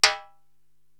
Timbale Hi Rim, Korg TR-Rack

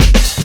COMBI, Houseworx; Ueberschall; Workz-9

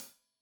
Hat Loose, Hi-Hat, Cymbals Stereo; Deeply Sampled Drums

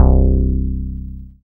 BAS MKS-50, Roland

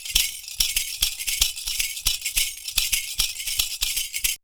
PERCU; Univers Sons; Raricussions

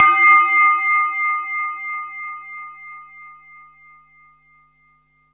Bells, Synth Bells; Dream Zone

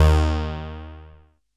JUNO BASS

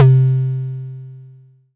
SY TAMBI; ModelCycles

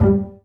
BA PIZZ G2, Orchestra; Best Service; Peter Siedlaczek's Advanced Orchestra

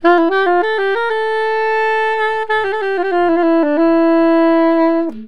SAX, Balkanish Traditions

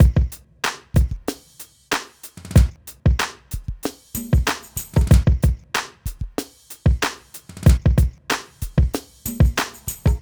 Drums, Hip Hop

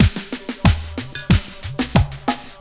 92 EASTE, loops; AMG; Now CD-ROM (Roland)

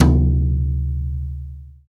TOM XBRUSH, Brush Kit

